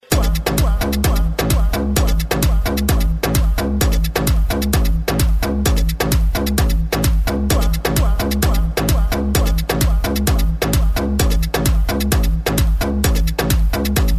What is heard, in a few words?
Super Club Sound